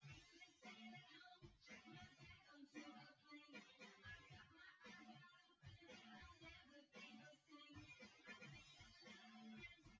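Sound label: with drums synth conv 250ms 5cm; SiSEC08; SiSEC underdetermined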